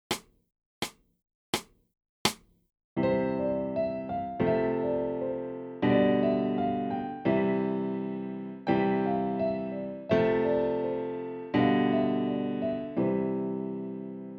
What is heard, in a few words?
CHORD PROGRESSIONS